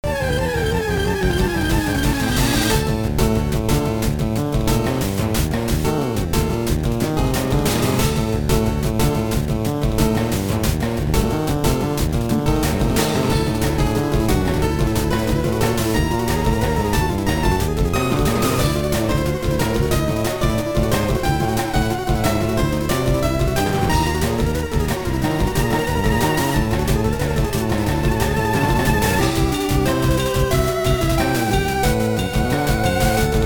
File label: battle; MagicMayhem